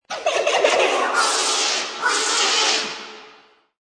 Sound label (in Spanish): risa escalofriante